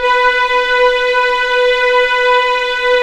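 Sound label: B4 legato piano, violins; East West; The Ultimate String Collection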